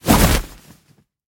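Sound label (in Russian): молниеносное появление супергероя шуршащего плащом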